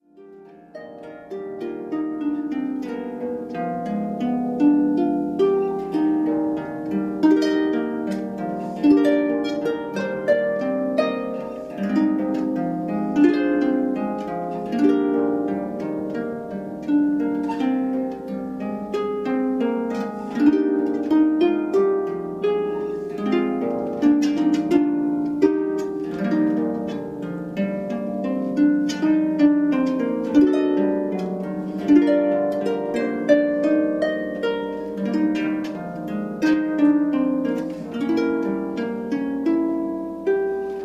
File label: for Solo Harp